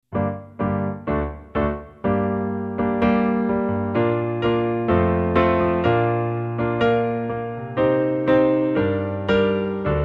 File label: Schola Sanctus; San Lorenzo